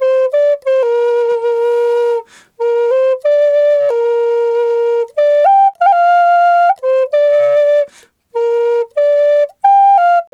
G2 93, Didgeridoo; Big Fish Audio - Didgeridoo and Other Primitive Instruments

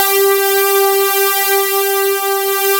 JUP6 BUZZ, Jupiter Synths; E-MU Formula 4000 Series Vol. 3 – Analog Odyssey

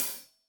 Hat Loose, Hi-Hat, Cymbals Stereo

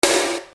Snare Dr

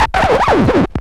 scratched LP; E-MU Formula 4000 Series Vol. 2; OrbitPercMaster

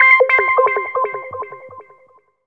sfx c, Synth FX; Chillout (ambient1&2)

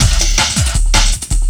LOOP, 160 BPM, Drum & Bass

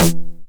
909 SD Tape Sat

